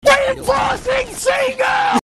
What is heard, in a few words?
seagull